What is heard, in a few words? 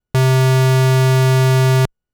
Sub C3, keys; fleet keys Samples